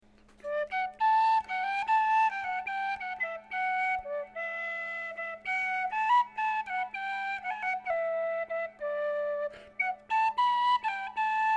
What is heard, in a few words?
whistlers morning impro penny whistle w echo; clarke orig d